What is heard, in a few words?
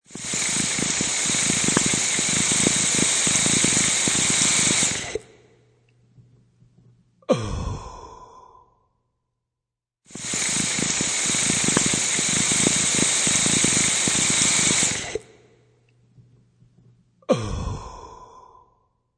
Waterpipe Exhale